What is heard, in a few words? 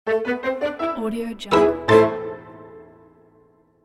cartoon musical conclusion